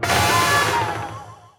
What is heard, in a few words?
Restaurant - Combo Host Stab; Bee Movie Game